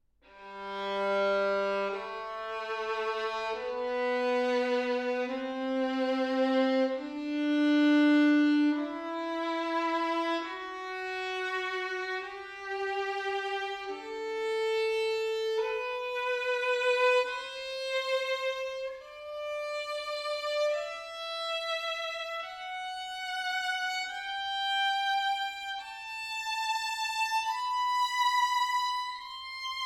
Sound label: Violin G major scale solo